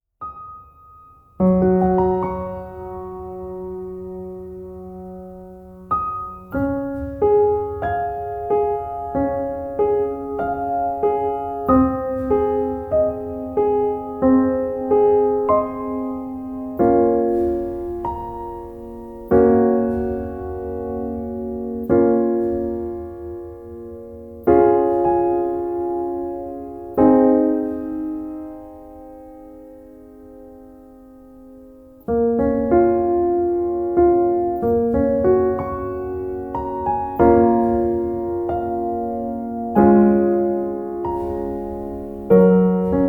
Instrumental Jazz Music Ambient, Romantique jazz d ambiance club